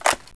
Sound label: svdex foley, weapons